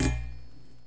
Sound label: Metal Kick Rub